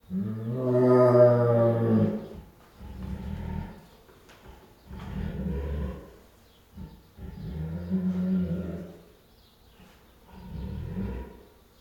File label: Female Bengal Tiger at Zoo, with Growls, Snarls and Whines; Tigers; Sound Ideas